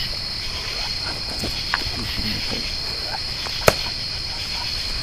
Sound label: evil laugh